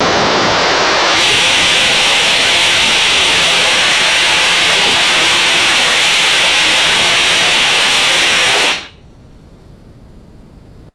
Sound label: continuous high pressure rocket thruster blast; aircraft